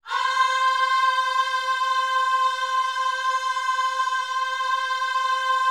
OHS C 5, Gospel; Spectrasonics; Vocal Planet Vol.1 Gospel; akai

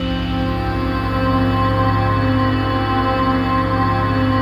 ATMOPAD; Best Service; XX Large; Pads

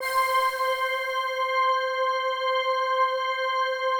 PAD, ATMO PADS; Best Service; XX Large

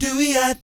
DOOEEOT, Vocal Jazz Fx

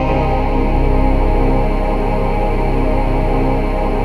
VOICE PAD, ATMO PADS; Best Service; XX Large

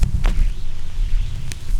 CRACK; E-Lab; X Static Goldmine 2; akai

